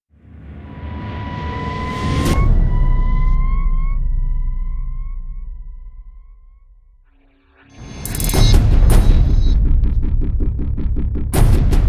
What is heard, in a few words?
drums fx